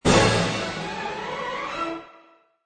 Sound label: Orch Hit n Run